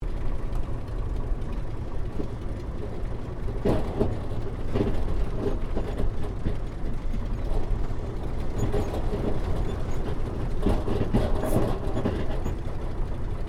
AIRCRAFT RYAN PT22 TAXI SHUTDOWN INTERIOR; 1942; Airplanes

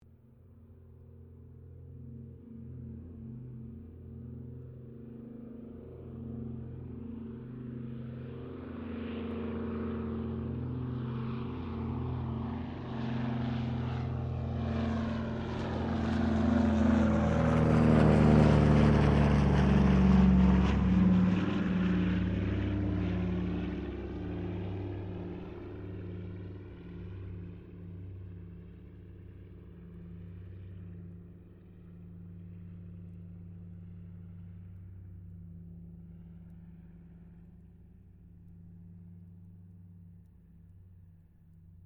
AIRCRAFT DOUGLAS DC3 PASS; Qantas 1942 DC3; Airplanes (Sound Effects:Vehicles)

